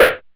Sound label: Bit Block, DIGITAL KIT